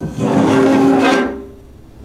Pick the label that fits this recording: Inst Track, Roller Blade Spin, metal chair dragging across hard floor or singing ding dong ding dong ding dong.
metal chair dragging across hard floor